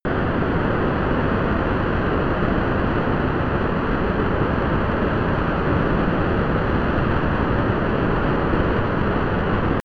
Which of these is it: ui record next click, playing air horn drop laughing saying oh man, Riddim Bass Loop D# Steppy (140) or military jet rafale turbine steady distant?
military jet rafale turbine steady distant